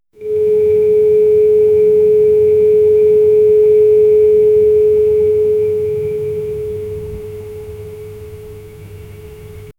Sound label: mp5a4, machine gun